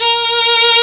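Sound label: STR VLN, violin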